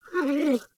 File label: Puppy Idle Coo; Vocals; Warframe; Kubrow